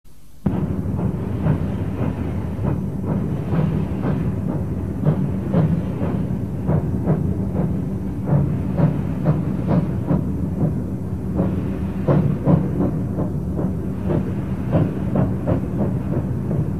Schleudern Grupenpfeifen, Eisenbahn; alsdorf

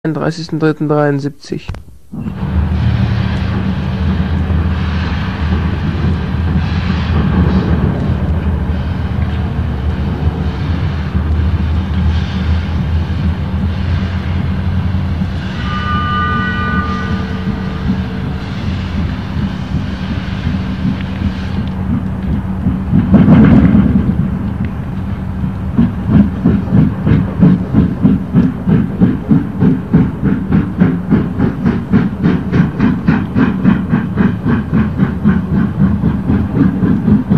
Schwere Anfahrt Pfeifen in Grube, eisenbahn; alsdorf

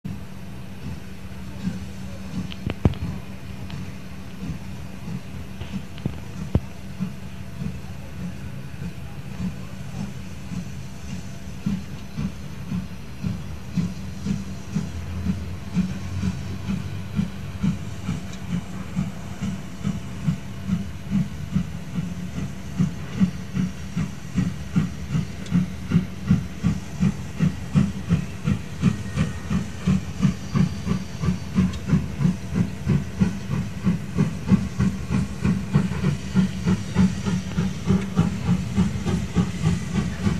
Anfahrt; alsdorf

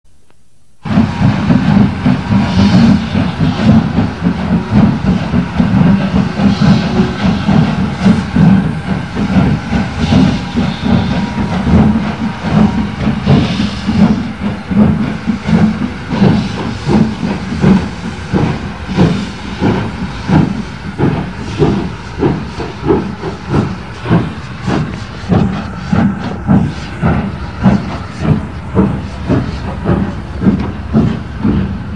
Anfahrt Schranken; alsdorf

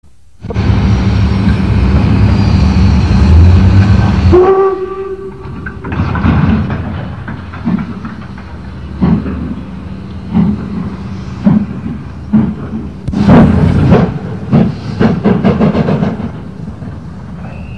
Abstossen; alsdorf

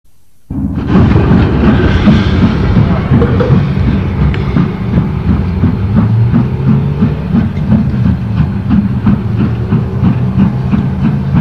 Lok; alsdorf

